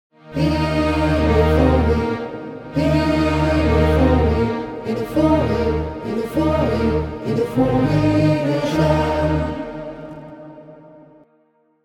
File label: furry hallelujah